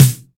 Clean Snare Drum Sound C Key, steel snare drum sample tuned to the C note